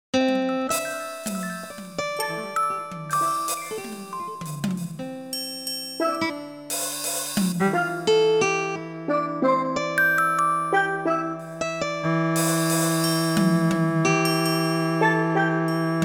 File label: golden ratio cello tune; fractal music; Multiple seeds